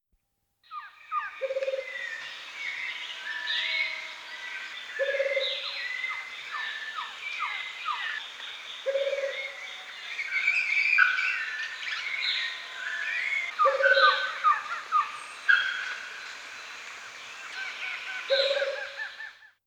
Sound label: Rufous Capped Motmot